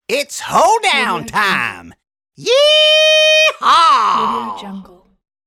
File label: hoedown time